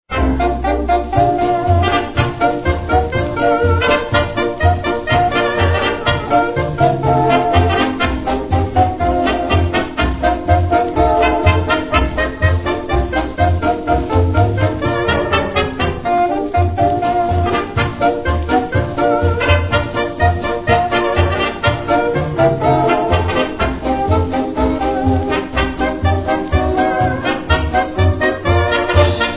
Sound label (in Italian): JAZZ SINFONICO; 78 giri; Dal Film "RE DI DENARI"; COLUMBIA